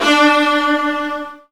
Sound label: Virtuoso Strings